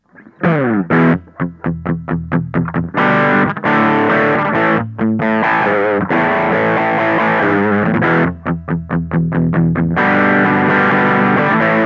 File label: crunch